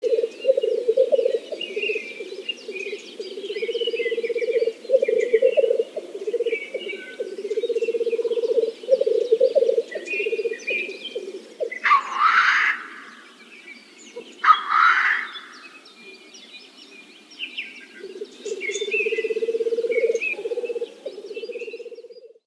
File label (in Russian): Teterev